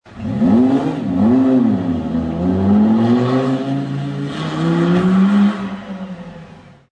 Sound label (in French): Gallardo - acceleration (exterieur); lamborghini